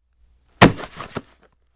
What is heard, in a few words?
Football Grab Heavy, football